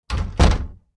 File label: door; Inseminator